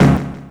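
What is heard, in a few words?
percussion fx; Best Service Dance Mega Drums; PERC-FX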